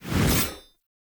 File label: Two Handed Axe Whoosh; Weapons; Enemy; Axes; Sinecure Two Handed Axe